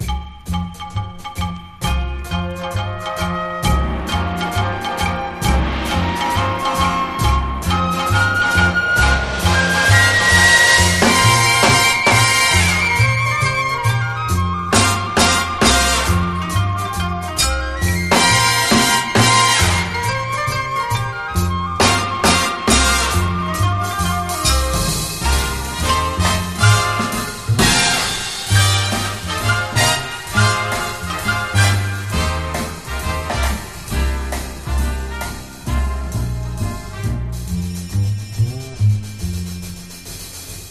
BRASS AND BAMBOO; 1963; space-age

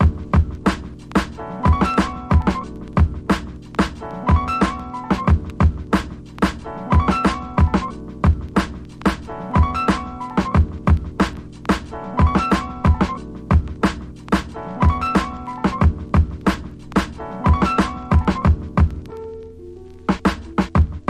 INSTRUMENTAL

